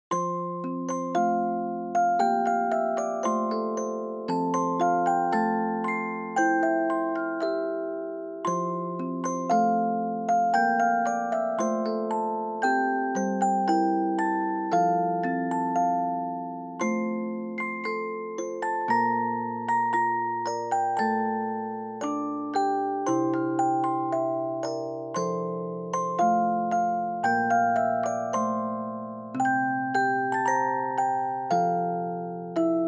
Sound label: Dry Fast